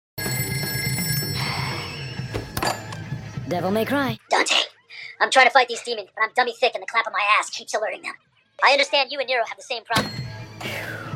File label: devils cry